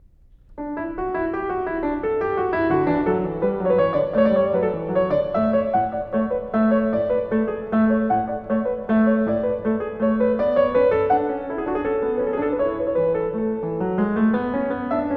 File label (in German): Einstimmige Inventionen III D-Dur, Klavier; Inventions; BWV 774